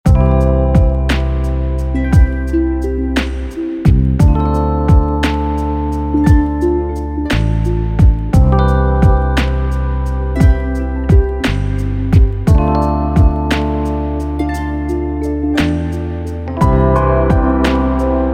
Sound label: Dreamy Waltz